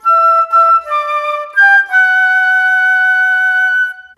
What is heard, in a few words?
Flute, Orchestral Series Rock and Pop; Sonic Foundry (Sony Creative Software); Orchestral Series VOL-4; Rock-Pop 03